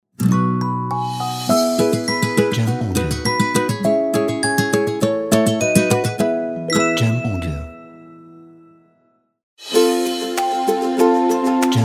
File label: Upbeat Logo; Upbeat Logo Pack